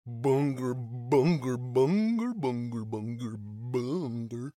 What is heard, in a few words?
stunned